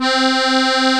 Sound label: AKORDEON, ORGAN; AKAI S-Series CD-ROM Sound Library VOL-8